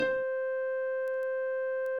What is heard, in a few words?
Instrument